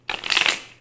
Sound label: shuffling cards deck